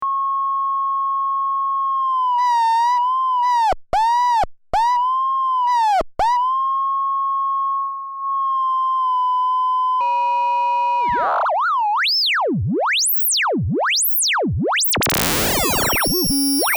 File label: Oscillator Mode Modulation